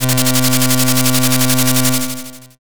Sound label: slot spin